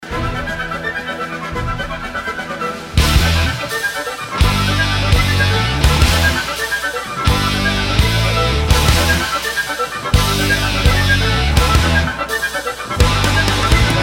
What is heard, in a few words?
instrumental